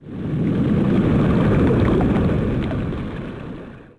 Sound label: wave overhead; DAoC